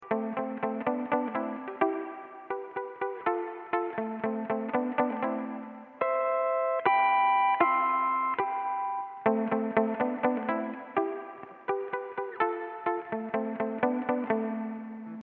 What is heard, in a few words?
hawaiian piece